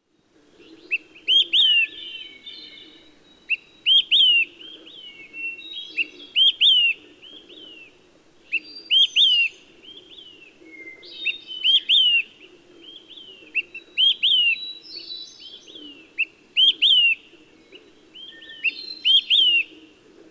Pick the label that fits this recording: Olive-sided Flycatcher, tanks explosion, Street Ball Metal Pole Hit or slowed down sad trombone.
Olive-sided Flycatcher